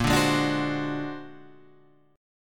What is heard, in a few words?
A sharp Augmented 9th; A#+9 Chord {6 5 6 7 7 8} strummed